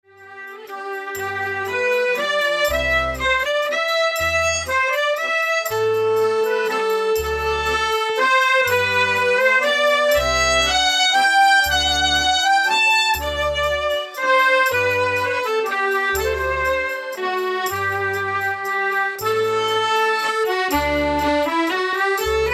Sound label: Waltz Set Sample, Maypole Dance Music